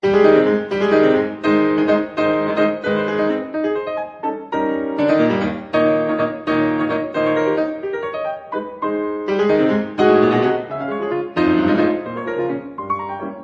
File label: fantasy in c major ringtone